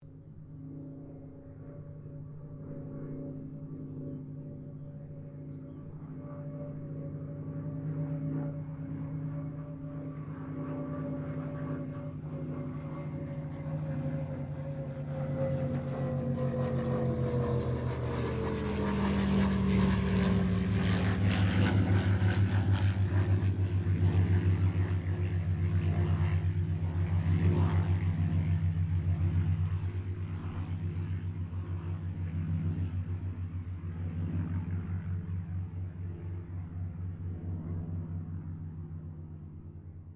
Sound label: Kittyhawk Boomerang pass, Airplane; Mixed aircraft squadrons; Vehicles; Aircraft Collection